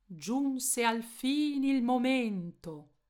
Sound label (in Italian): recitativo; giunse alfin